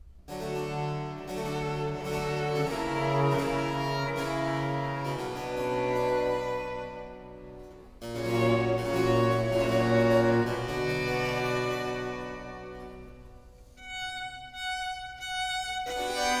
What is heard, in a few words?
Trio Sonata for 2 violins & continuo in G minor, Adagio